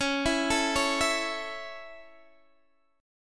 C sharp Minor Sharp 5th C sharp Guitar Open D, chord